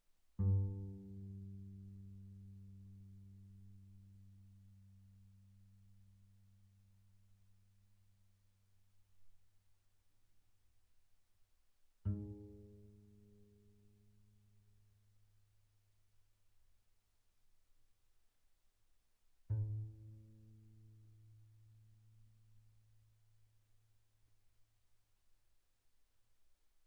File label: Bass pizz sul G pp G2 B2, double bass